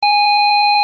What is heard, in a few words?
Beep; Museum Alarm Options